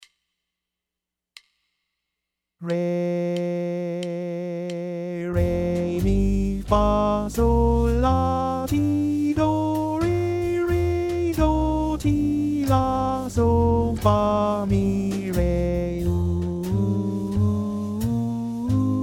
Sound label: F Dorian